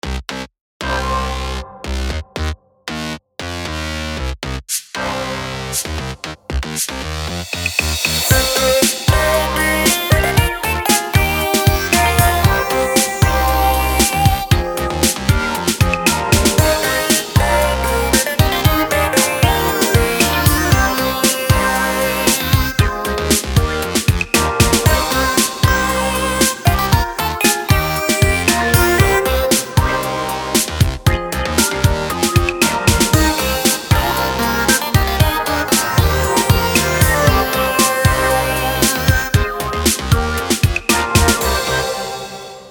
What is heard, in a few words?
electro